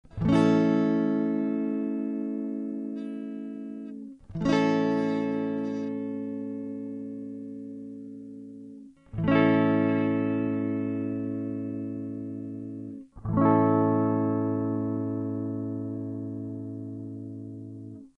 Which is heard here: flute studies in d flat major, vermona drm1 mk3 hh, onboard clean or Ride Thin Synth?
onboard clean